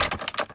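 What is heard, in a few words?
Inspect Movement; Valorant; ares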